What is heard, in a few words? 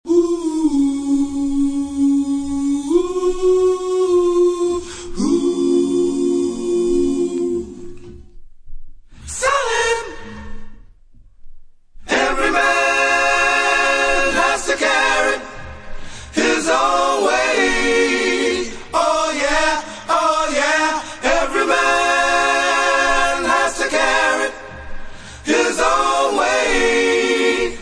background vocals acappella